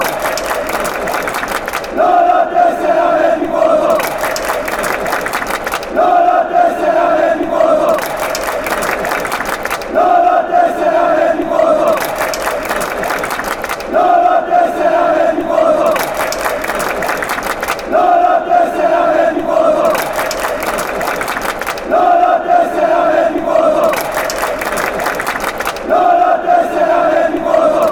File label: id card fan chants